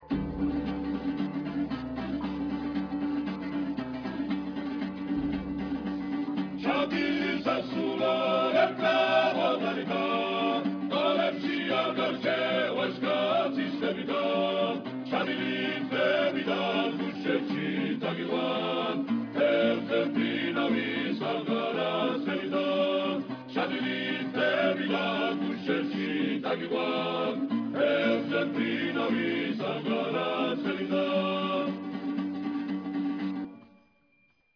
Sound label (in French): Pandouri voix